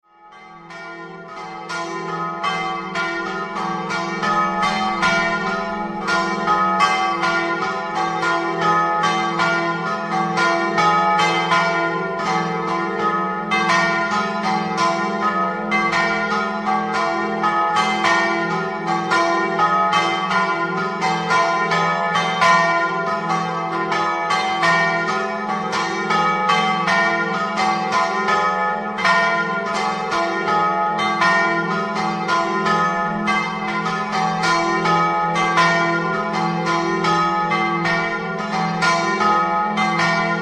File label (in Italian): campane; COIA